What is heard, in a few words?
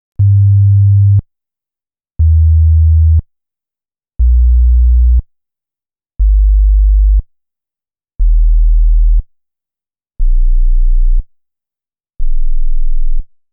bass sine samsung galaxysii volume max matched; anandtech; galaxysii review